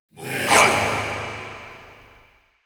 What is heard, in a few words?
Processed Battle Shouts, Viking Vocal